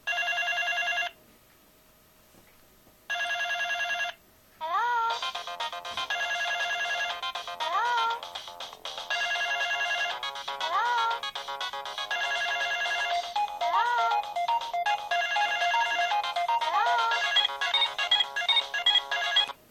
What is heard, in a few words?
samsung sghe700 ringtone